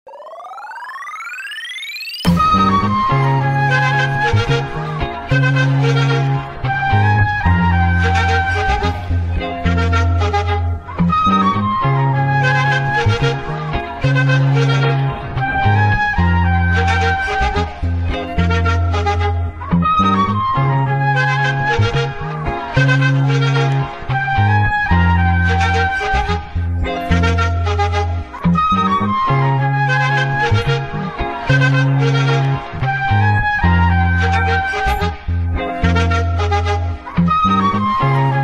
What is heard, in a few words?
instrumental